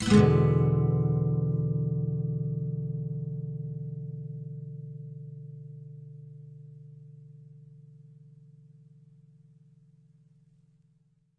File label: Nylon Chord E7; Nylon Guitar Chords